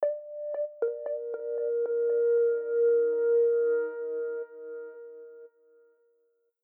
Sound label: chime only; publiccodepodcast